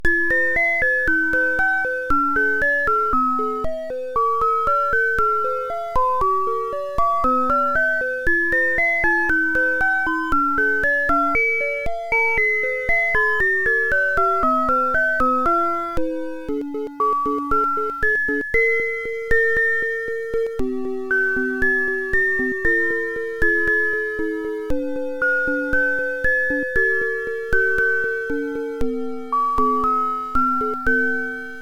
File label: sine tri